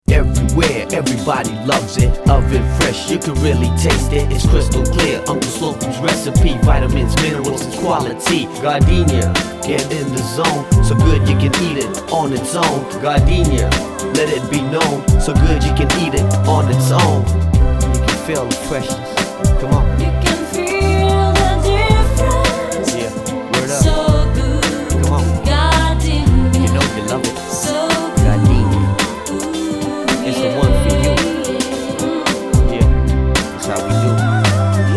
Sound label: rap